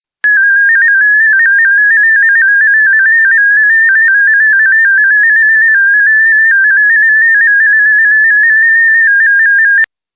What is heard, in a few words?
DominoEx-16, digital modes; Digimodes